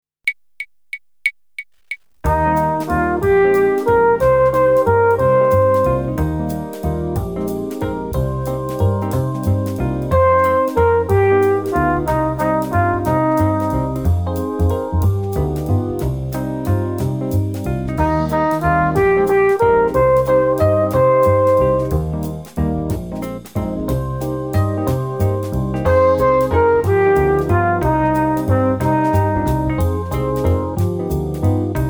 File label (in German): Echo Ubung